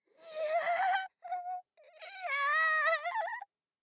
いやぁぁ…

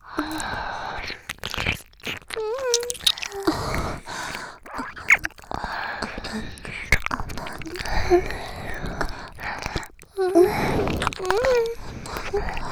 耳舐め喘ぎ; お屋敷メイドのハーレム淫語ヘヴン; バイノーラルオナサポ音声; 静華

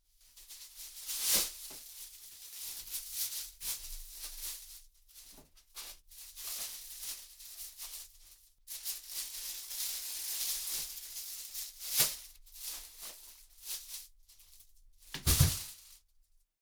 買い物袋を持つ→置く; 3DIO; 食事に関する音

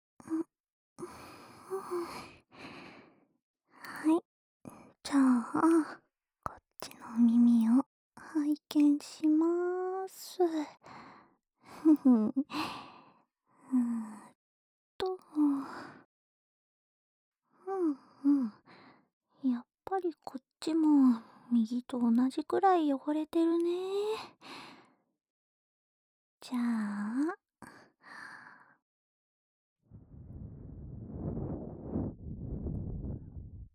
左の耳かき; まだまだ続くよ? 眠かったら寝ちゃってもいいからね?

